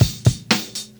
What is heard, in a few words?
Drum loops Boombap